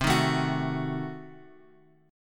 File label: C Diminished 7th down Bass Standard; strummed; JGuitar